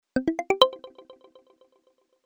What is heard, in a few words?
Power On; VTR Chile